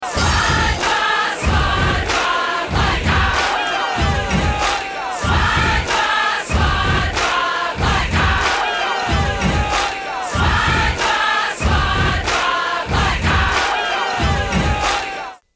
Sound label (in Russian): Свадьба горько